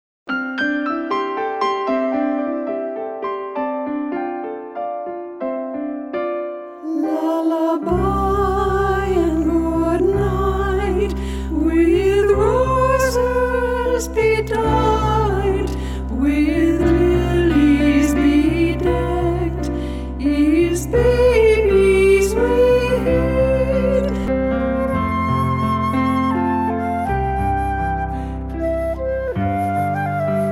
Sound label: Brahms Lullaby